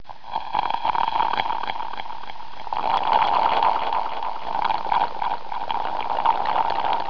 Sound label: comic